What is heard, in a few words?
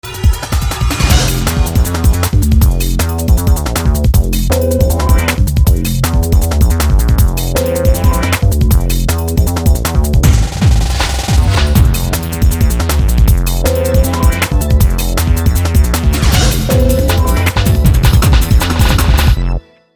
techno